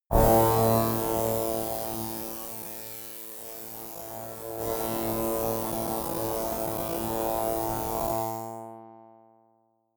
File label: space saw long; energie